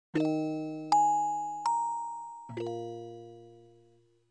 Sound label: Casio CT-460; quest for glory 1 original soundtrack